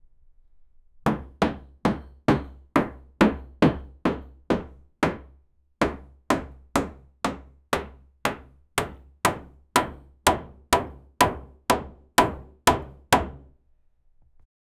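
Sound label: judge hammer hitting table, court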